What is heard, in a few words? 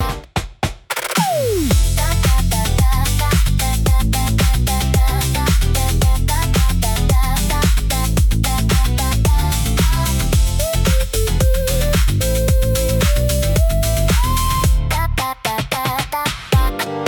loop